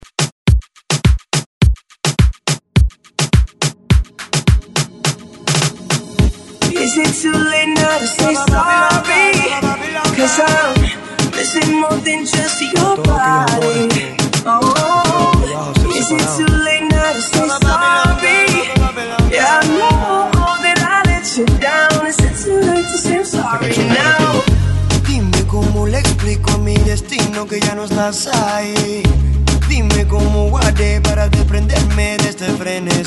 Reggaeton 105 Bpm; DJDOLLS

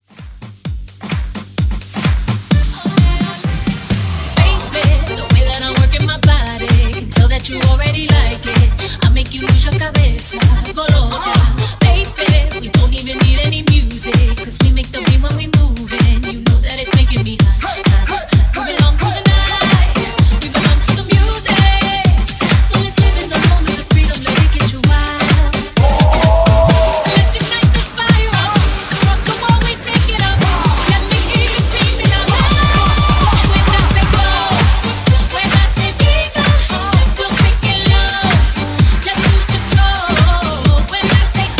Rework Intro 129 BPM

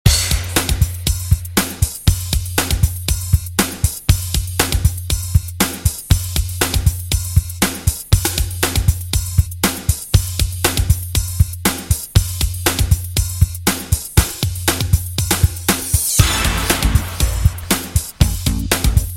80s Dj Intro Outro 119Bpm

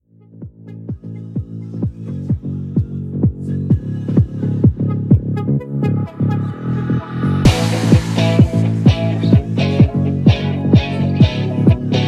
Epic Acap; Remixfreakz

